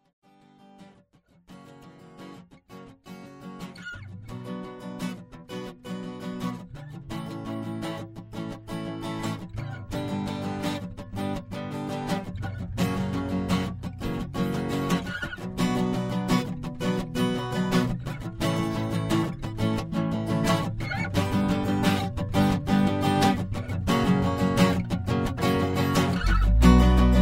acoustic

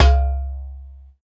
Timbale Skin; Drums; Misc Percussion

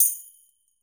Tambourine Hit Hard; Drums

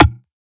E-Perc Low Knock, Electronic Percussion; Drums; Ableton Live 12 Lite; Core Library

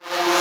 FX Dark Swell; FX Hit; Drums; Ableton Live 12 Lite; Core Library

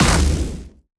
aether hit strong; action; impact

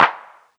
Clap 808; One Shots; Drums; Prommer; Ableton Live 12 Lite; Core Library

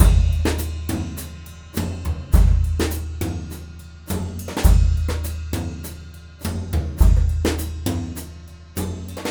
brushes; Ableton Live 12 Lite; Max

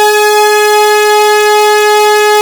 C4, Synths Multisamples; Sh Pwr; Ableton Live 12 Lite; Core Library

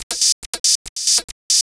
Hi Hat Single Track; Sony; ACID Pro 7.0

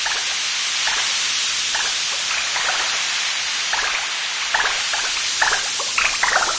drink fizz loop; There; ThereClient

